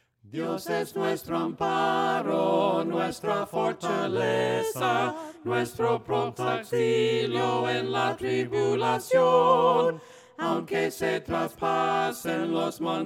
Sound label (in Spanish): DIOS ES NUESTRO AMPARO VOCES, himnos a capella; cantos del camino